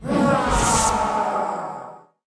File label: phantom archer dead, monster